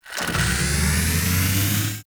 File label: Mods Motor Large Start; Warframe; Hub; Liset Machines; Tenno Mods